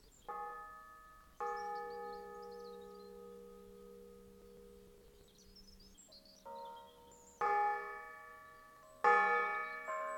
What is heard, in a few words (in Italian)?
Campane, San Gregorio di Stellanello; Savona; Liguria; Archivio Balma Campane Liguria; Polifonia